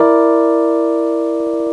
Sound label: piano keys